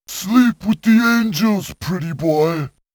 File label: ROUND CLEAR; Taito Digital Sound Archives Arcade Vol.4